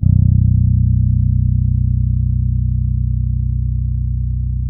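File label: B 1, fretless, bass; Spectrasonics; Bass Legends CD 1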